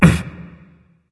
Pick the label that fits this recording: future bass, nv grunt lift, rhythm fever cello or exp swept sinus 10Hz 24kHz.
nv grunt lift